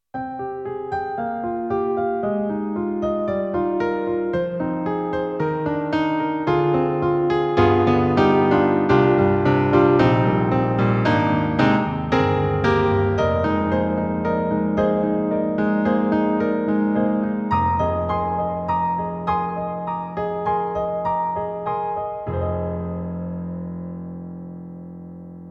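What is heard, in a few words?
Roland SRX-07 Grand Piano, XV-5050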